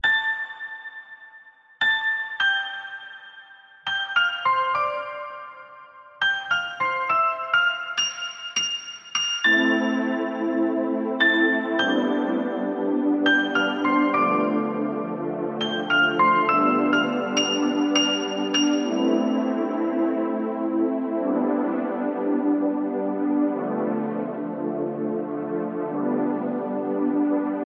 Samsung Galaxy F15 Remix Ringtone

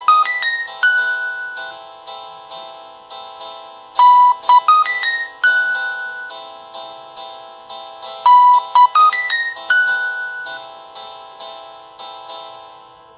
Samsung Galaxy A15 5G Ringtone

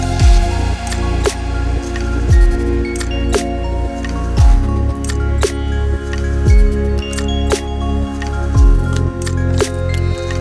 Samsung Galaxy M52 5G Official Ringtone

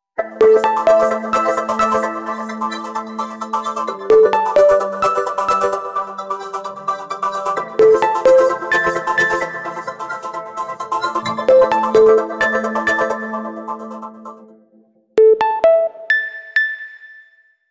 Ringtone; Oppo; Oppo F19 Pro Plus 5G

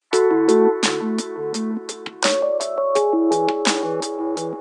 Samsung F54 Sms Ringtone

